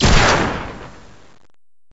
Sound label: fire rocket; SAS: Zombie Assault 4